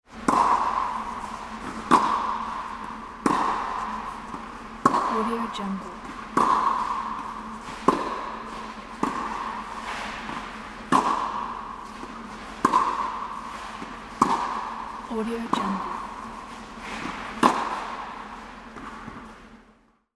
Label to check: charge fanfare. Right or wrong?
Wrong